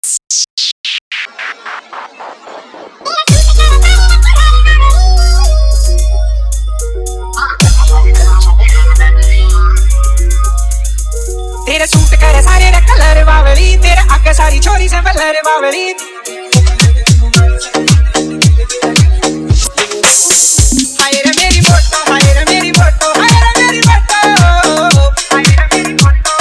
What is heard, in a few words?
Hard Vibration Remix Haryanvi Dj Song